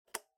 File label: Clock Alarm (Toggle)